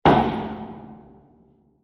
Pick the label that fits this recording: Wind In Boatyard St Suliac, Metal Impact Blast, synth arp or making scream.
Metal Impact Blast